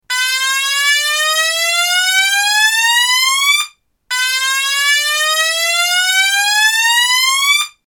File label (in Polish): ton